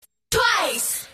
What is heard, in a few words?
notification